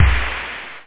snare; 808+909

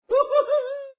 ough; santas gift jump

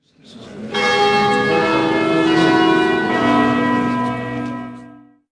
CAMPUS, buildings

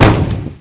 40MM FIR

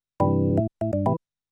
SYNTH HOUSE 125 A, Keys; eJay

